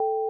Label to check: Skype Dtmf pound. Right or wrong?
Right